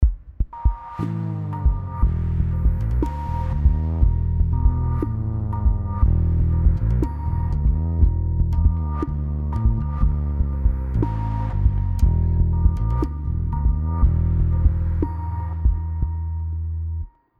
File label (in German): Low Structures Stereo Kompr SC Filter 350